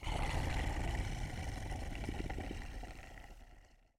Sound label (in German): Monster